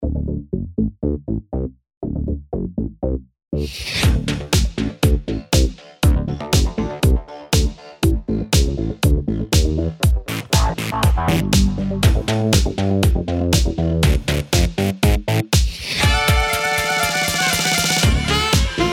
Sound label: Sax